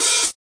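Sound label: classic hh open hat; Hip Hop; STYLE KITS; Classic Hip Hop Mega Kit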